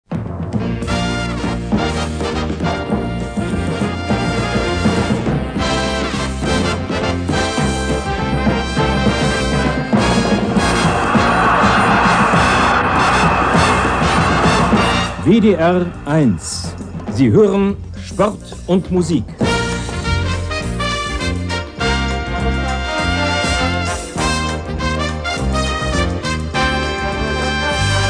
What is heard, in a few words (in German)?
sport und musik, jingles